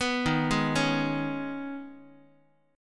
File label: D sharp 7th Suspended 4th Sharp 5th, Guitar; D#7sus4#5 arpeggiated; JGuitar